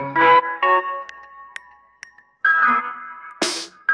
funny

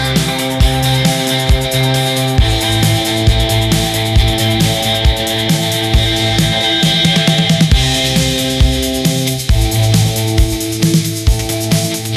ringtone rock

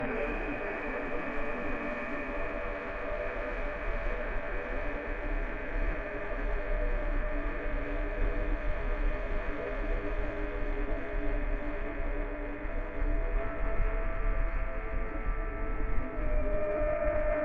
ambient nature

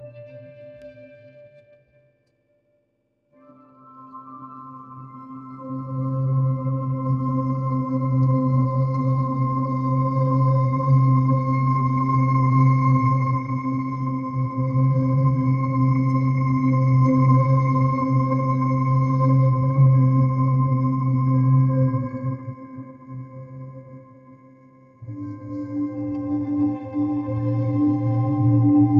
ambient nature

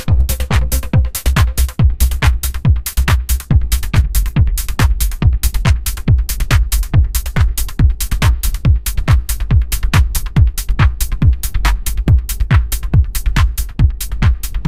ringtone hiphop

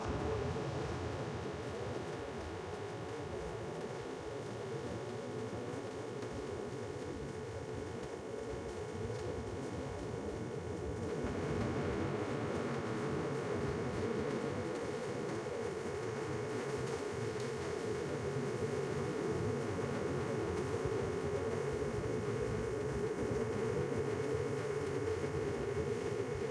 ambient nature

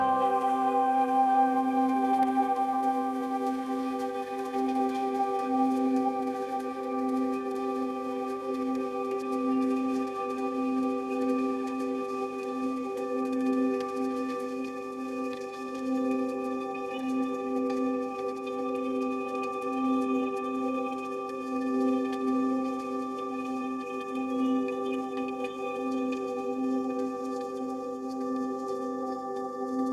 ambient meditation

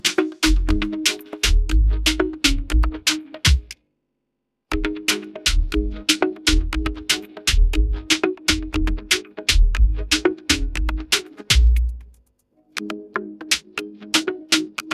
ringtone afrobeats

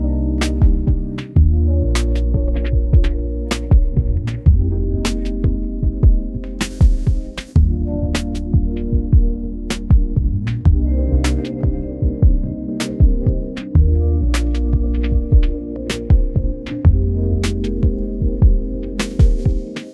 ringtone rnb

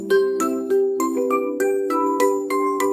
notification

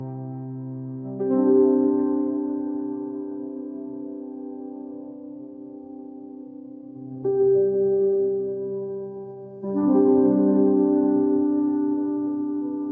ringtone emotional

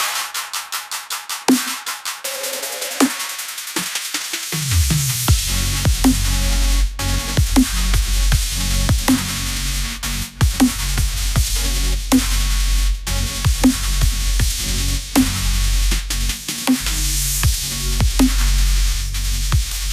ringtone edm

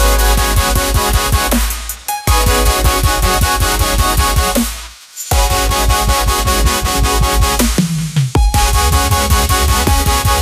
ringtone edm

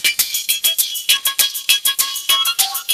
funny

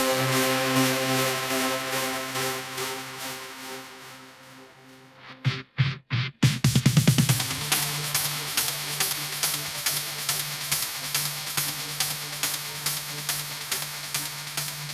ringtone hiphop

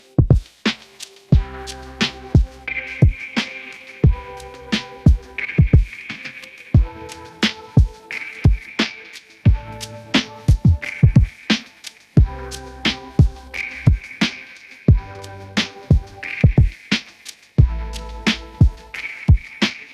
ringtone lofi

